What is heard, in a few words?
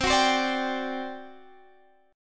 G Major 7th Suspended 4th, C